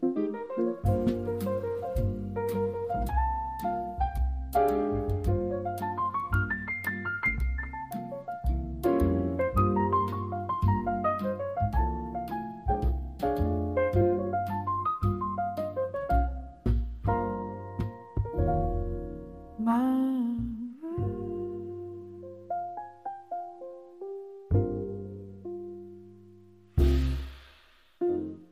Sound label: jazz